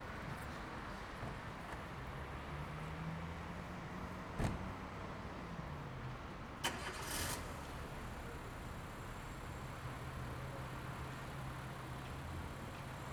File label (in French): sortie travail - Amb ville Angers; ZoomH2N